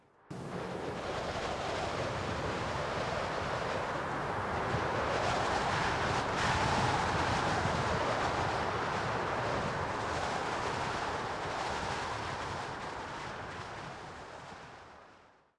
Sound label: wind gust